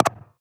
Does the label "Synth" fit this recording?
Yes